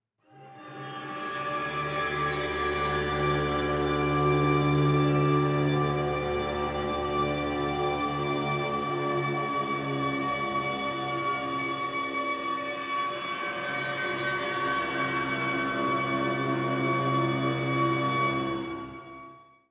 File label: Monster Hunting; The Witcher 3 Wild Hunt; Skellige